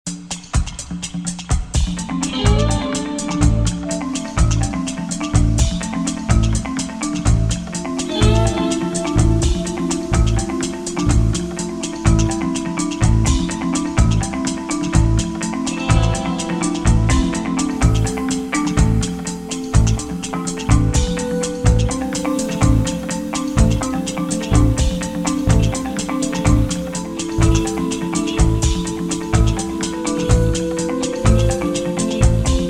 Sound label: Polyrhythmic